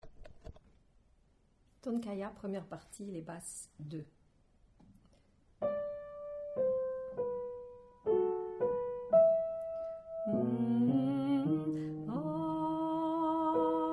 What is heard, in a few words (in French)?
Basse